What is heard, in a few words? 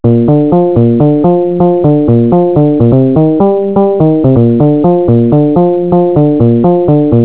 tune